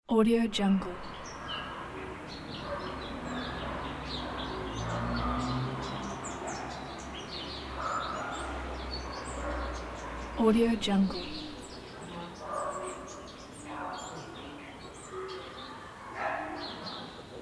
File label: birds chirping ambience